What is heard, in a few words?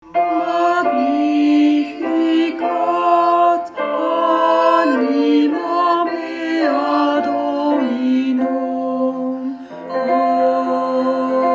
Magnificat Choral